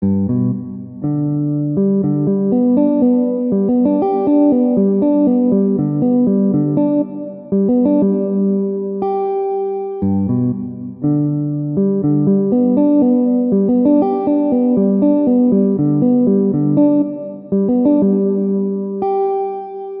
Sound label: triad